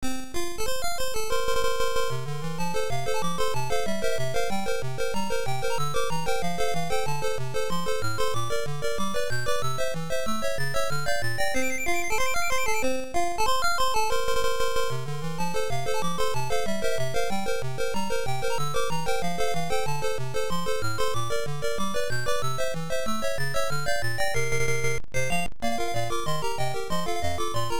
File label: Title Beeper; Stifflip & Co